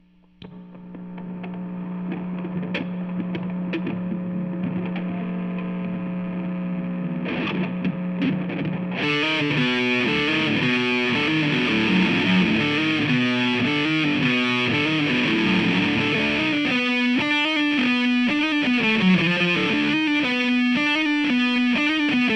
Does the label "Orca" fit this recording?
No